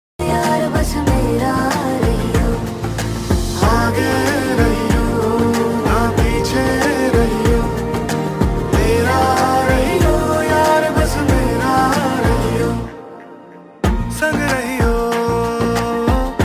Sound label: slowed reverb lofi love song